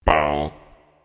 click; monstergo; pokebot

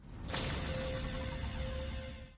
force crate; star-wars-rebels-team-tactis; ezra

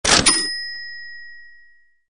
kaching; soldiersfury

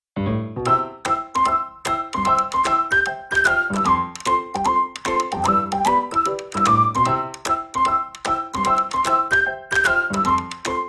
cute music; Mr.-potato

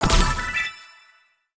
match; YummiCookie; French

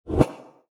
menu swoosh; inlogic-checkerslegend

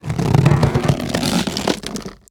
rubber; Angry Zombies

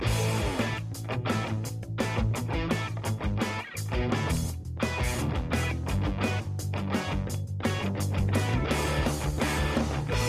music usa race loop; lightning speed

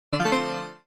character lvl; sweetcandymania

